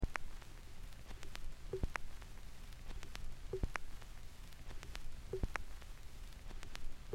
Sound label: record noise, turntable